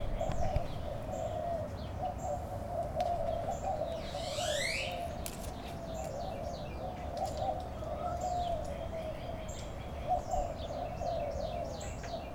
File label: field recording